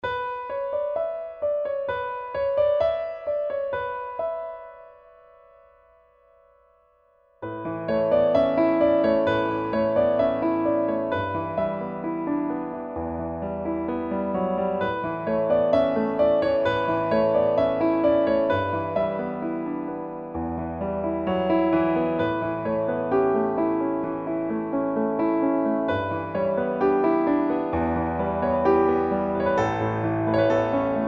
Smell of Spring 130bpm

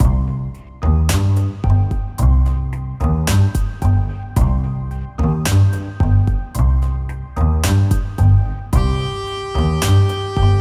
Tango

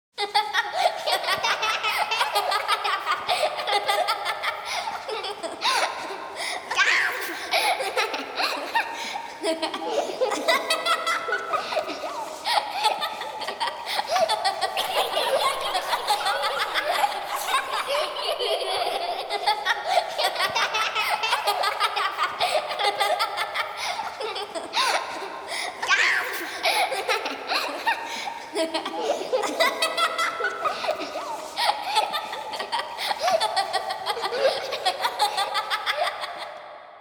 Loughing

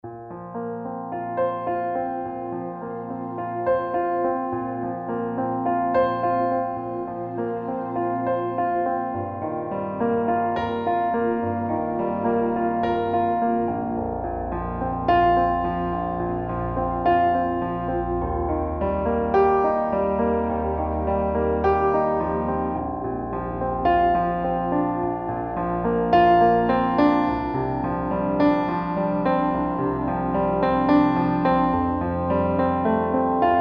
68bpm

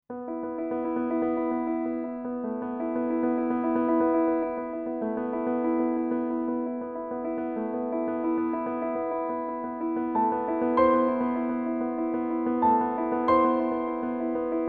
95bpm

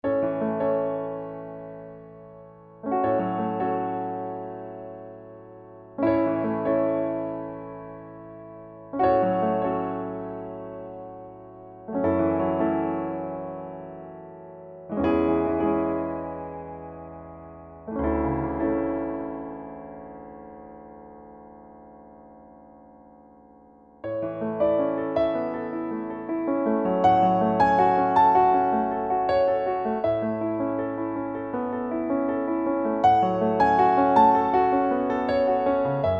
80bpm

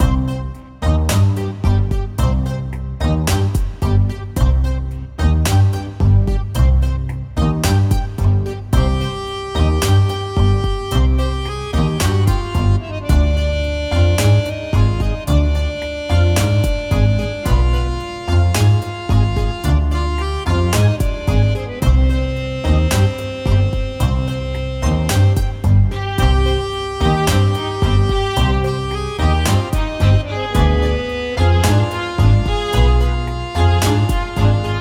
Tango

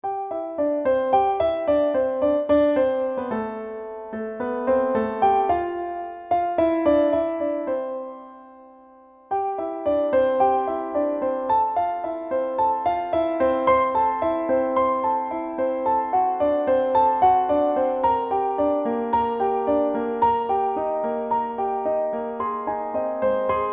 110bpm

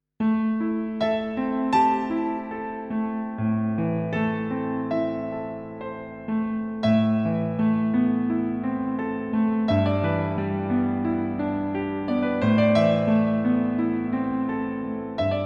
Rainy Saturday 75bpm

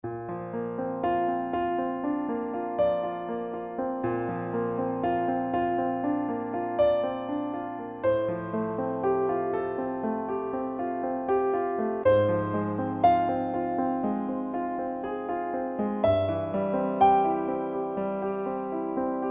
The Lake 120bpm

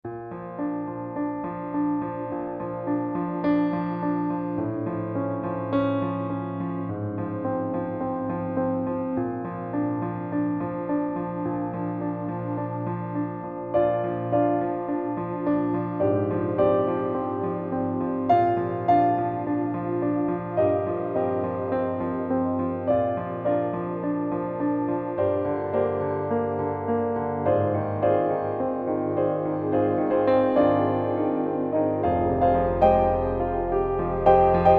Yellow Sun 105bpm

